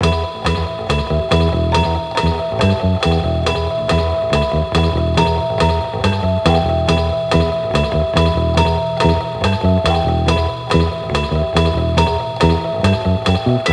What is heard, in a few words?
Bike Fast Low; bully scholarship edition